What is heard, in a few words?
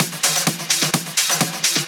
House loops 128BPM; Vengeance Samples